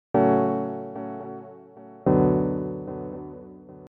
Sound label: Synt Loops; Unit Rcrds